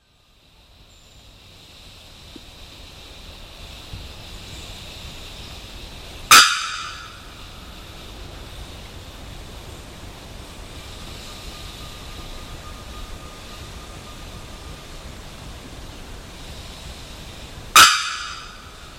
Bearded Bellbird

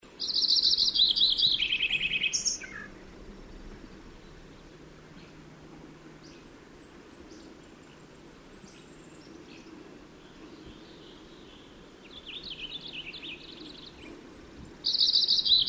Lincoln's Sparrow; Sparrows

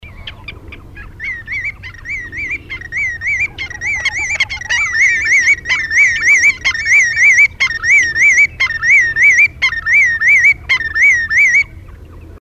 Willet; Shorebirds

